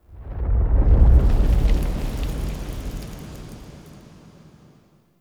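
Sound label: Distant Explosion Debris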